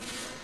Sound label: saber hit